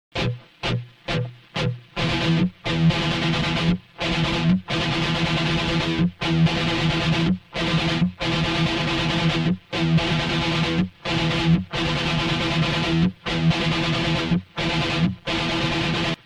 thrash mix

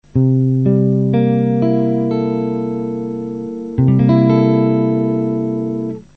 c7 add6 barre

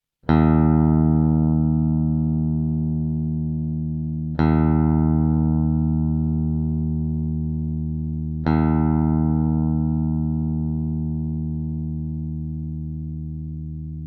eb tune

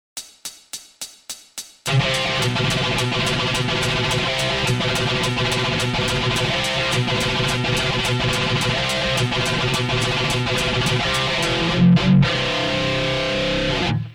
thrash

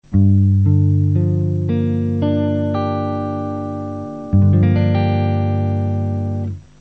gm7 barre

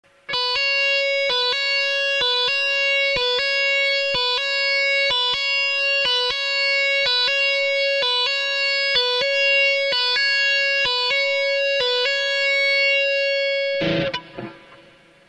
tap

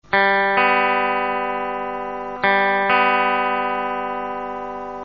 ear tune

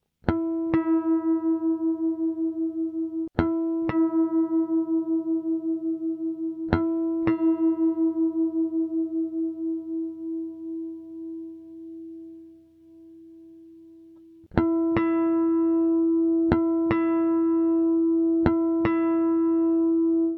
e a harmonic tune up

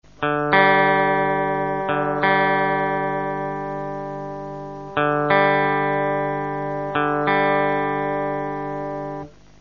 ear tune

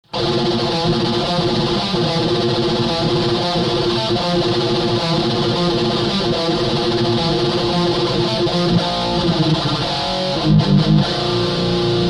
thrash

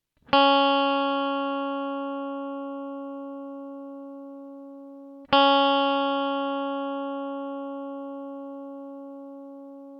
open a c sharp

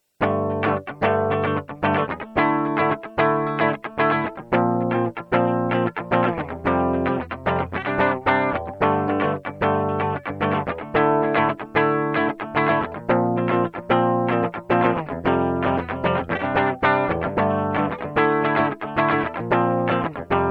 5add9 clean

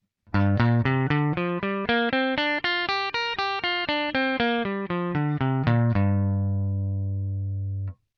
minor pentatonic scale

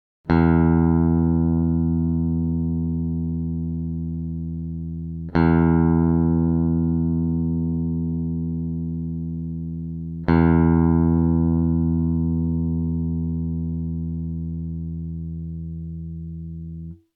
e string

